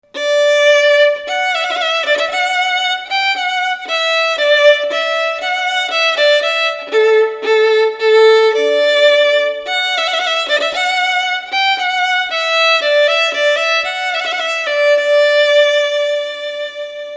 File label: violin; Trumpet Voluntary